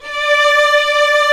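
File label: strings, 8pc; String Master Series; Vlns 2-5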